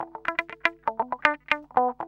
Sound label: GUI, LICKS; 120 LICKS